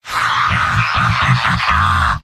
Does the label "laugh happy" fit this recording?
Yes